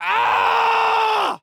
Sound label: Horror Scary Human Male Scream